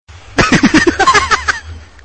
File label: silly laugh